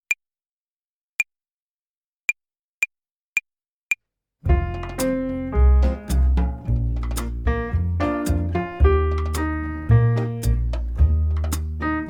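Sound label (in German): Akkordzerlegungen Übung, Play along Slow, Klavier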